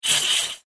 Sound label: The Spell Of Chains, magic sound; Fantasy sound effect; role playing games, magic videogames